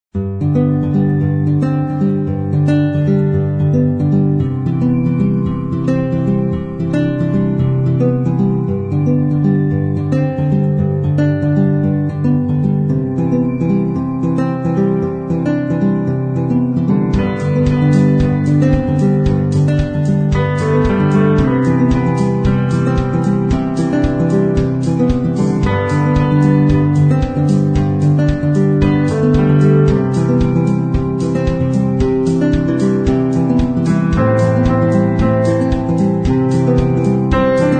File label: Warm Summer